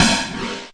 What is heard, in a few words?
GATE SNR, Gated Reverse Slapback Kit; drums full kits; style kits